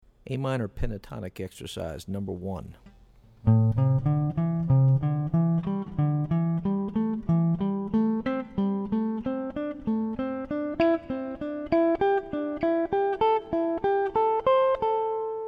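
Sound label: Am Pentatonic Exercise, guitar; lead skills materials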